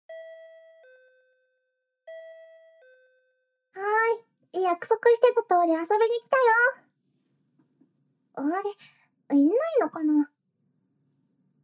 耳舐め; ASMR; 彼女が家来て耳舐め

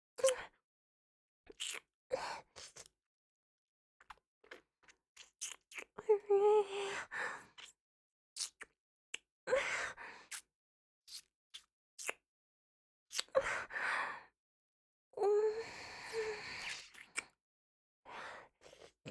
kiss

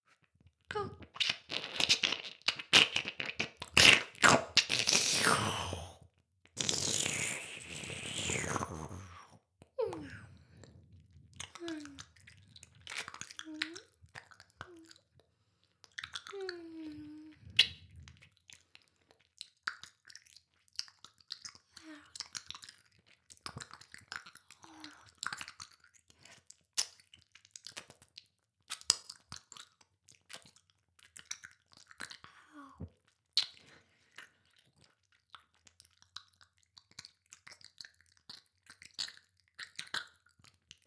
耳舐め音声, ASMR; 魔法少女マスカリマッスル

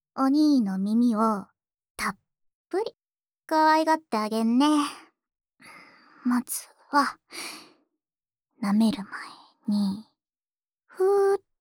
耳フーフーと耳掻き（左右耳）

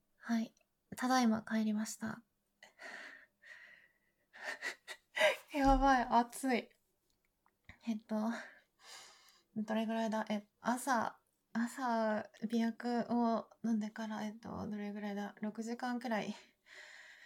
潮吹き; えっ…人間ってこんなに潮吹き出来るの

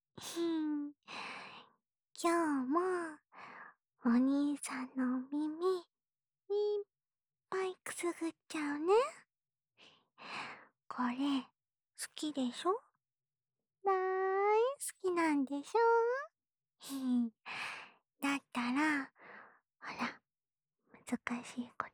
安眠用お耳ふーふー（右耳）, ASMR

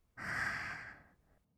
"は", ブレス, 吸う音; ASMR; 1200 kawaii voice!!

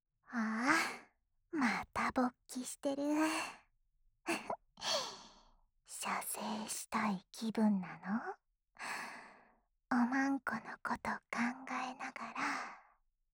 オナサポ用耳舐めループ（右耳）, ASMR

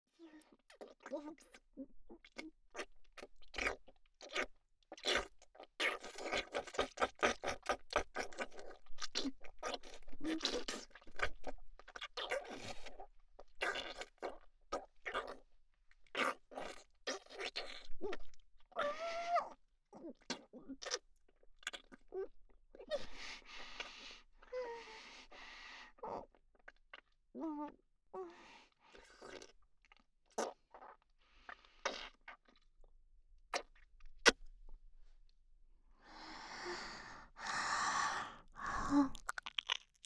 耳元 で ぐちゅぐちゅ ごっくん; お口で受け止めて

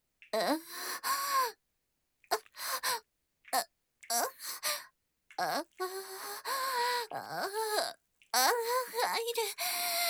喘ぎ（SEあり）喘息; ASMR

